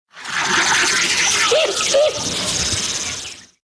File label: african sacred ibis song